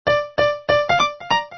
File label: piano